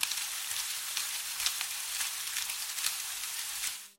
gentle rain leaves

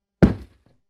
dull body thump

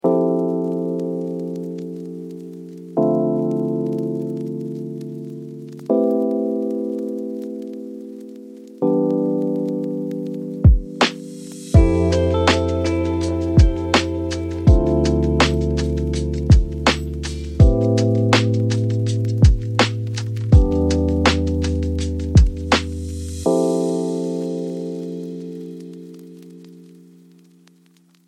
vintage warmth study

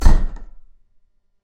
forceful door slam

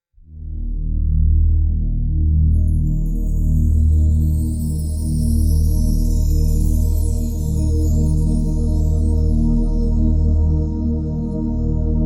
ethereal soundscape drone

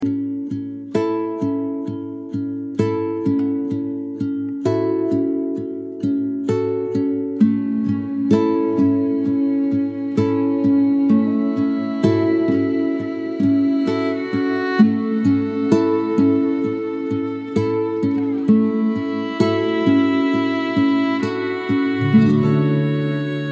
dreamy acoustic drift

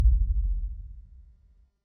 deep resonating boom

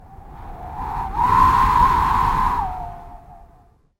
canyon wind gust

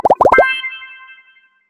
bubbly confirmation sound

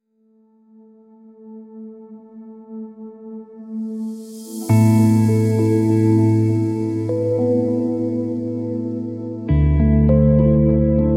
dreamy electric mood

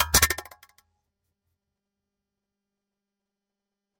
large raindrop impact

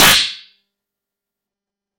metallic whip swipe

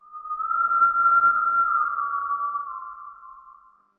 distant whistling wind

